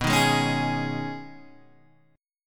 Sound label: B 6th Add 9th B down Guitar Open G